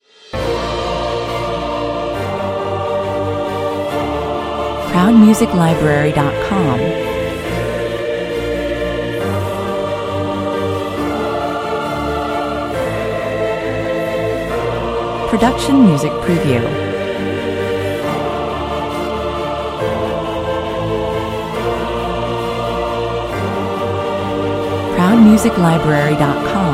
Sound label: no drums intense